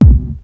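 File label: bass drum slimy; 300 Drum Machines; Yamaha Motif ES-Series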